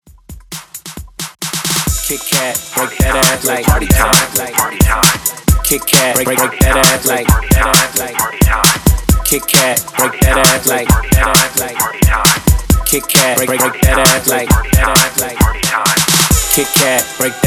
Club Party Up Dance In Outro 133 BPM

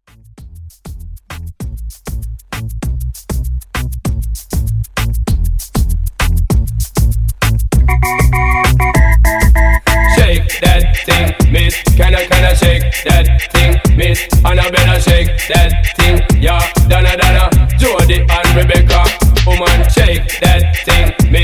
Quick Mashup

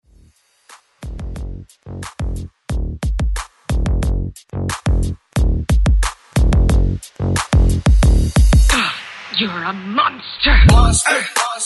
ReDrum 90 BPM

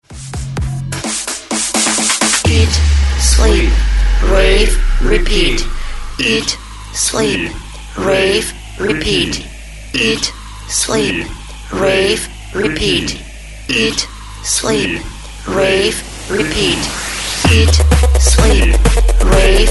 130Bpm BREAKMIX

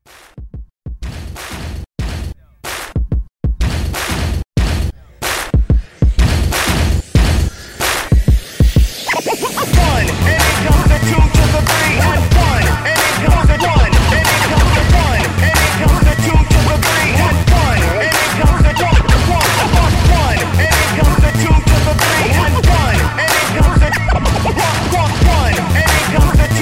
Hype Re Drum 93

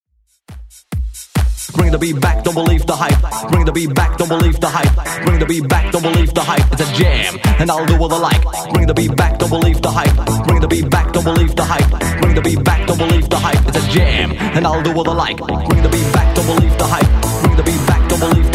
90s Redrum 138bpm

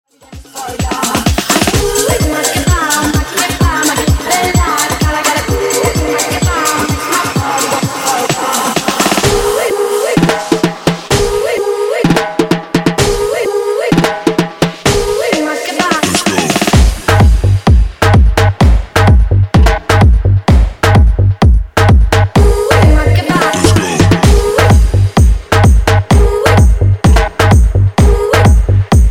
Hype DJ Intro Outro Remix 128Bpm

